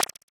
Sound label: Switch On; Se Sys Switch On